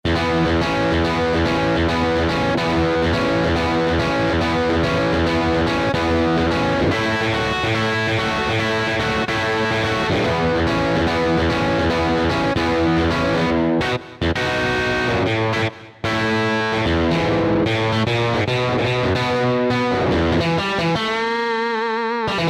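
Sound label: strat, guitar; Factory Patches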